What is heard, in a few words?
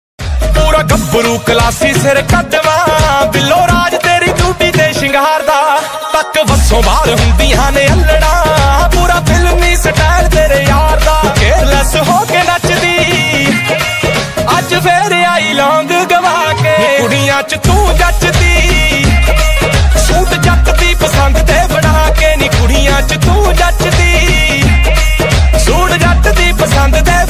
DJ Punjabi Ringtone